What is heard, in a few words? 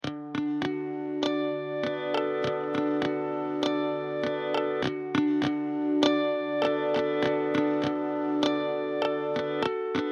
D 100 Harmonics Groove